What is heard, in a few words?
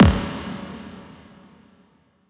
Impact